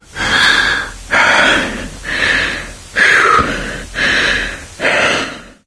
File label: heavy breathing; voice; source engine; left 4 dead 2; survivor